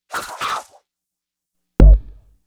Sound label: Perc FX; Sonic Foundry; Sony Creative Software; Stylus Pressure Urban Grooves on Digital Wax; Nu Tang